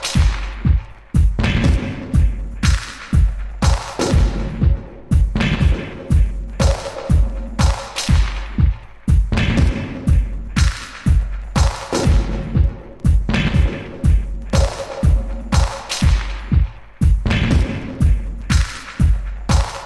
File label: BEAT